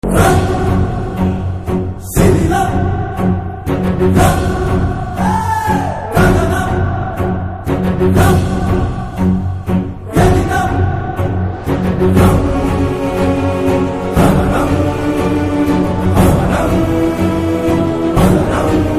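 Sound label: background music Telugu Ringtone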